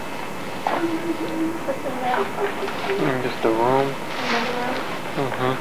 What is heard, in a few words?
singing; This was an abandoned high school we had access to from the local police department.